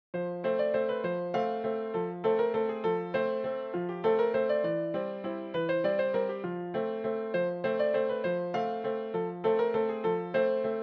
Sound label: spanish dance